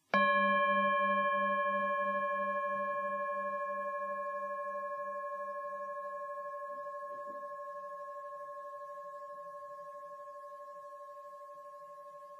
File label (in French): son bol tibetain